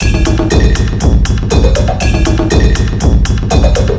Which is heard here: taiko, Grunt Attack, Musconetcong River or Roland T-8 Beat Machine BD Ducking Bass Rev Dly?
taiko